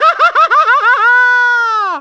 barnacle pull; mario; supermariobros

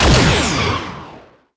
photon fire, weapons

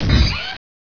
gun step

